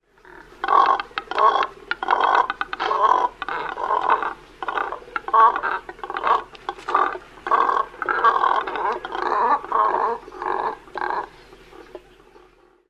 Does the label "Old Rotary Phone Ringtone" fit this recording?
No